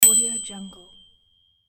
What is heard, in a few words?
bicycle bell